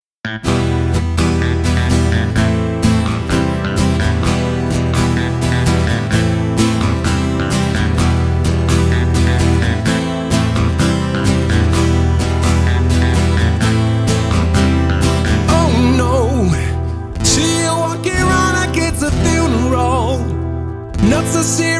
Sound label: country, acoustic guitar; kantri